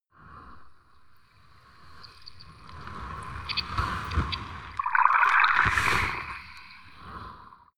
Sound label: abstract beach